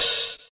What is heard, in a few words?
damped open hat one shot c sharp key, Royality free open high-hat sample tuned to the C# note